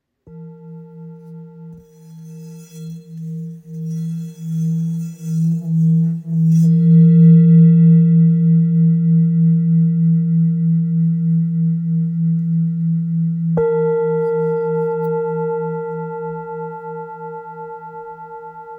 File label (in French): Bol ancien mi 535g